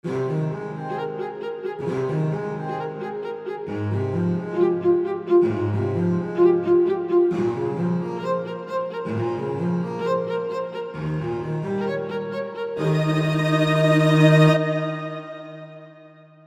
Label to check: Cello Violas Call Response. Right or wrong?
Right